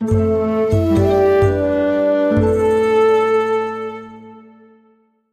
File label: music nightmare; kak priruchit drakona; vysizhivat yajtsa